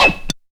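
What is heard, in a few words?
Percussion Noise; Zero-G Jungle Warfare 1, 2 & 3; 90 s Sample CDs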